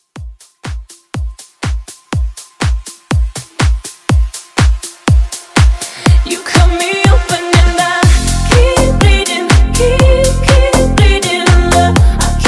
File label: House Bleeding Rework 122 CLEAN